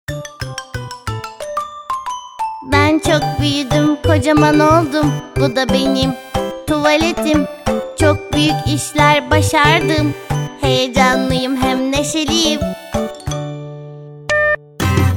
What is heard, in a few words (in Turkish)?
vokal, jingle reklam; Jingle Reklam Yapım; BiberSA Prodüksiyon